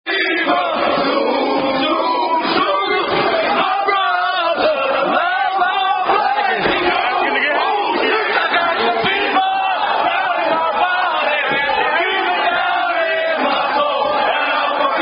Fraternity Chant; Zoom Zoom National Convention Alpha Phi Alpha 2008

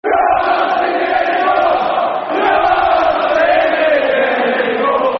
You're Not Singing Anymore, Football Chants; Reading; Reading Rfc

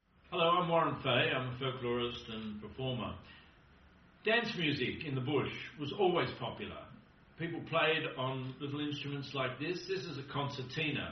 Bush Dance Music

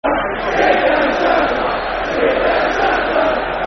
Sit Down Shut Up, Football Chants (Soccer Songs); Queens Park Rangers Qpr

